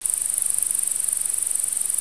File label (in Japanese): usuirosasakiri, insects